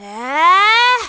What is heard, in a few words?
Ehhh; Talo: "Ehhh?"